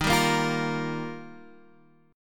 D sharp Major Add 9th, Guitar Standard; strummed; D#add9; x,6,5,3,6,6; JGuitar

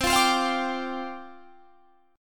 G 7th Suspended 4th C

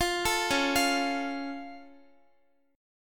C sharp Augmented down Guitar, chord; arpeggiated; tuning=4E,4A,5D,5G,5C,6F

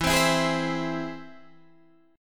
E Augmented, Guitar, Standard; E+ strummed; JGuitar

